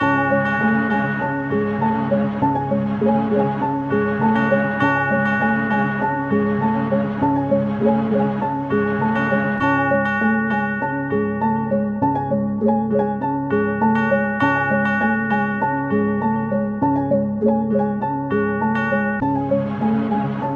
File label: 200 Bpm C# Phrygian, loops; Detroit Drumkit And MIDI Files ''Hard Times''